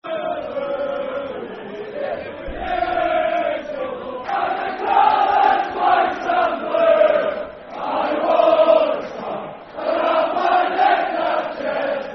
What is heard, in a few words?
The Scarf [Football Chant (Soccer Song)], Stockport; Stockport County Scfc